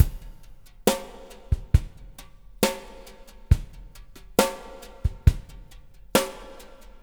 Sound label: DRUMS, Liquid Grooves; Spectrasonics; Liquid Grooves 1 & 2